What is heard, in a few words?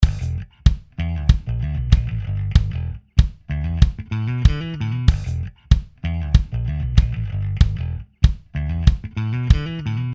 Kick Sample